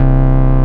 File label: ORGAN; Techno Trance